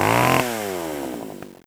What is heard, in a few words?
chainsaw attack; doom3